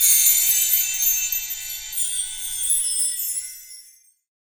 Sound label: BELL TREE; 3D Sound; 3D Keys VOL-1